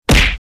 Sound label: Funny punch hit; shorts sound effects